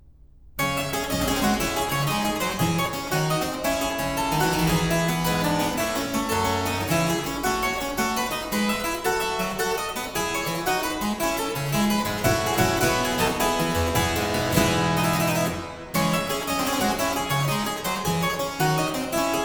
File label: Suite in D Minor Gigue, Harpsichord; Buxtehude; Opera Omnia; Harpsichord Works